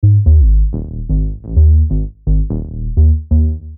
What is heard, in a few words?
G sharp m Bass, 128; Milky Way